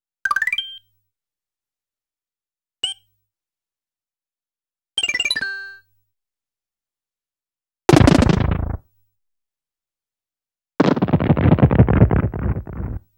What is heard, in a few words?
Map Path Appearance ~ Map Point Passage Sound ~ Sub Map Magical Switch Disappearance; super mario world live